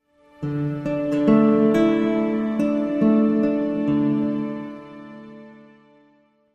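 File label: Music Logo Classical Guitar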